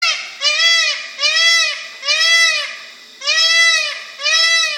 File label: Peafowl